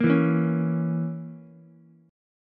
Bb Diminished Bb down Ukulele; strummed; chord shape

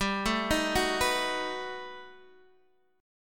B 7th G; B7/G; arpeggiated

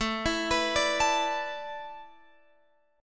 A Suspended 2nd Suspended 4th, Guitar, Open F; Asus2sus4; arpeggiated; x,16,16,18,17,16

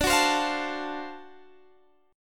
D 7th Sharp 9th D down Guitar Standard; strummed; JGuitar

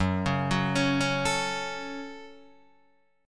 F sharp Minor F sharp, Guitar Open D

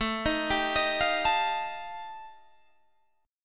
G 7th Suspended 2nd Sharp 5th A chord

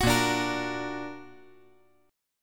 Bb Augmented Bb; strummed; JGuitar

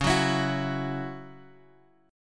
C sharp Minor Double Flat 5th C sharp, chord; C#mbb5 Chord {X 4 4 X 5 2} strummed

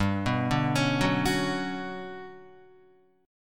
G Major Sharp 11th, Guitar; GM#11 arpeggiated

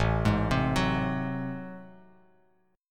A Minor Major 13th A, Bass Standard; arpeggiated; AmM13; JGuitar

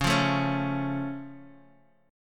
C sharp Augmented down Guitar DADGAD, chord

